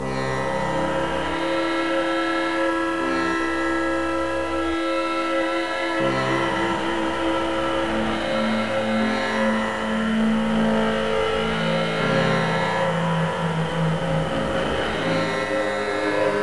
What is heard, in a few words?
drone